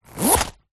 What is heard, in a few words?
purse pouch leather zip; foley